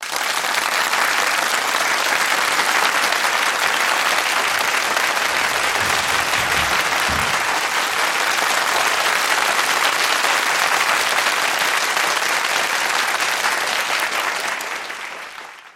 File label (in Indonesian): tepuk tangan